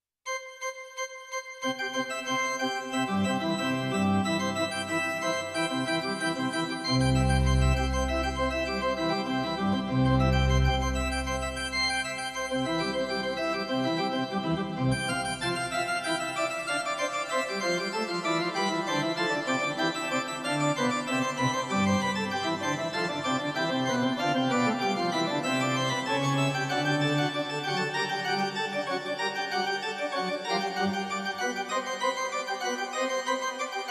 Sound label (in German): CAPELLA, Digitalorgeln; Scarlatti